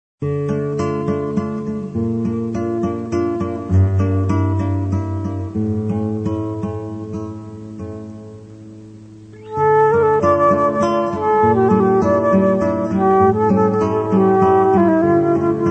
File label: duo flute guitar; schubert serenade